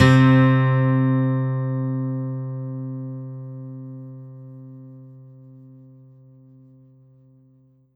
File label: Korg N1R 12 String Gt C3